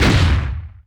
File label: mine bomb